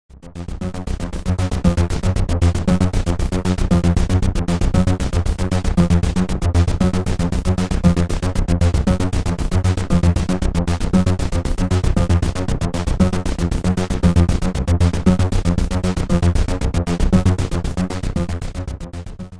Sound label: seq, evolver